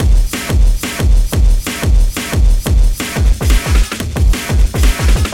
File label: Stalker Beat; demolitionkid